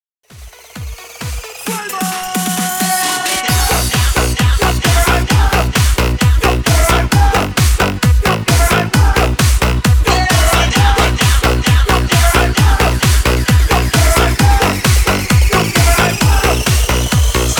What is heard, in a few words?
PARTY BANGER, 132 BPM